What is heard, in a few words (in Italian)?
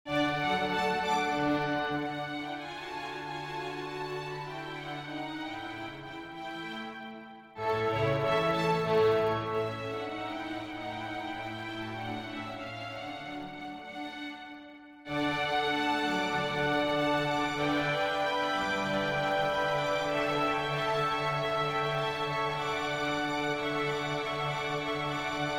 Concerto per Organo e Orchestra